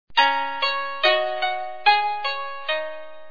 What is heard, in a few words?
mail; MRSHot2003